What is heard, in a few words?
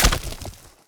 Coconut Break